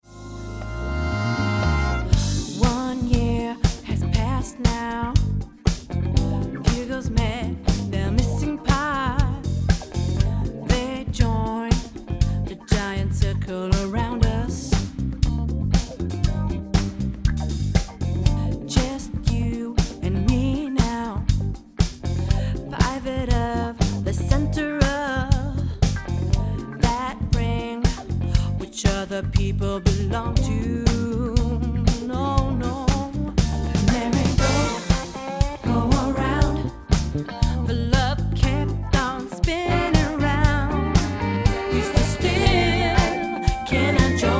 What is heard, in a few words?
FUNKY